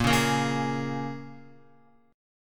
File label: Bb-Minor 7th-Bb Guitar; Bbm7 strummed